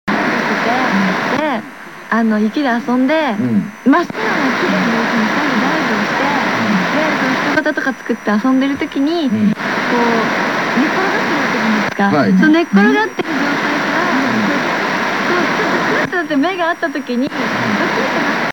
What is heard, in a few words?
noise, am antenna